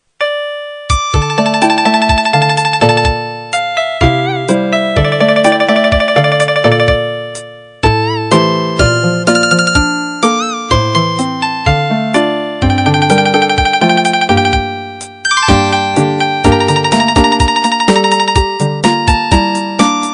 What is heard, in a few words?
midi chinese ghost story